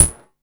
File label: fx-percussion, Techno; Techno ID; single-drums